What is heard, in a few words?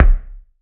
BD, BASS DRUMS; EdgeSounds